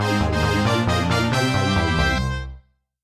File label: Clear Fanfare; WarioWare Inc. Mega Party Game